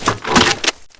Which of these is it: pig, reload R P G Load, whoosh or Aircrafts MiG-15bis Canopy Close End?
reload R P G Load